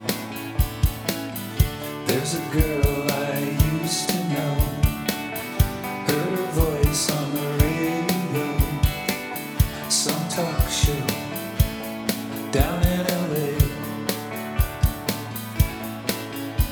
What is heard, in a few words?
Drum, Before; VoxengoDrumService